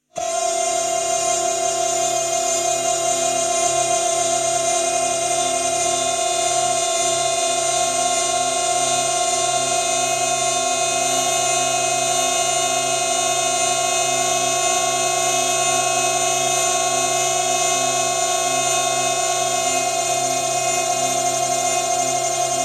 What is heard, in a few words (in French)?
bourree; bourree A Solomagne La Simplette